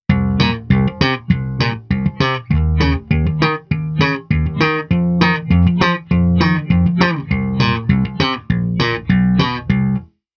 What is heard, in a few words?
Fender Preci